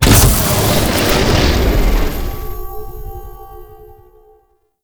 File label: Power Grid Energize; Warframe; Duviri Murmur